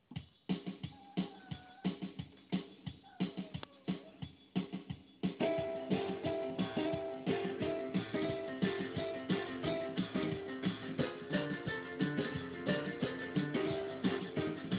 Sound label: blues